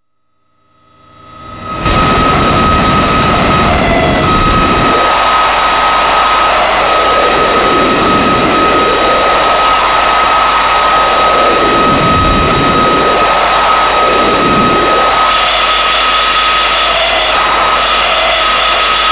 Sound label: analogue noise